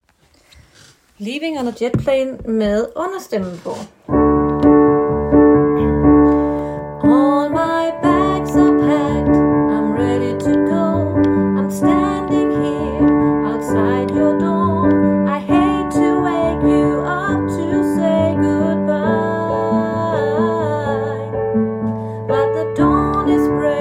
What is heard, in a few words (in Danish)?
understemme, kor